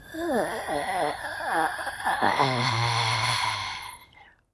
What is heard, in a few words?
zombie rand; Project Zomboid